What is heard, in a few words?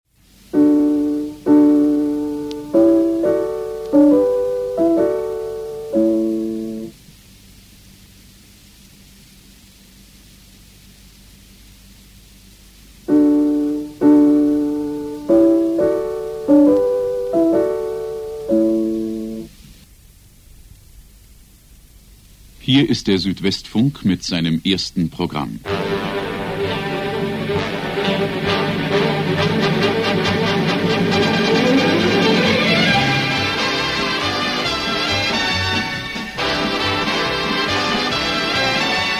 interval signal; swf1 1974